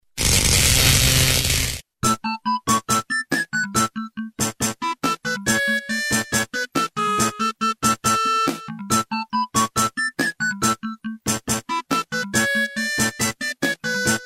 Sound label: arcade